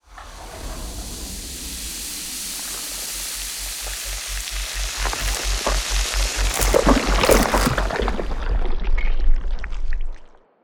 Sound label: blob dissolve; rifts; Stellaris; astral planes